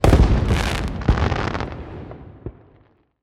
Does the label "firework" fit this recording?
Yes